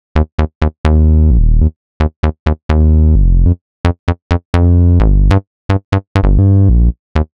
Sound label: Bass Loop 130 Gm; Bass Loops 130bpm; Downtown House